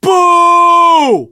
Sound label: boo; Source Engine; admin plugin